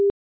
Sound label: Tone On Hold, IP Phones; Cisco; 7942G; English; IOS